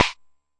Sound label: Jomox MBrane, drum machines; Jomox MBrane 11